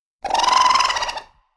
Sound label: Racoon Vocal; Animals; XBOX; BassProShopsTrophyHunter2007